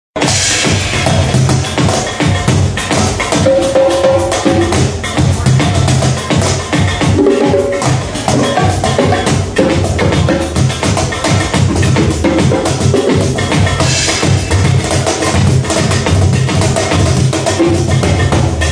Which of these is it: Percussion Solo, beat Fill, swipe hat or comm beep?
Percussion Solo